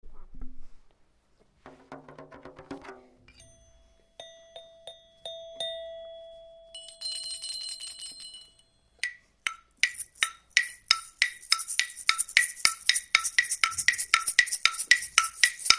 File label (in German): Eisenbahnmusik